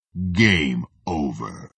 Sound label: game over; halo